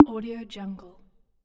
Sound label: tap sound